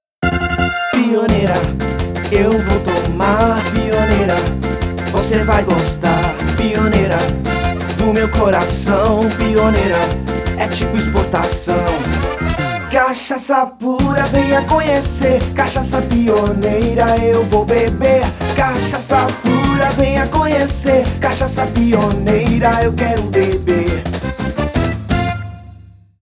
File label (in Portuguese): Jingle